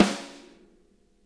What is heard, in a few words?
snare, drum kits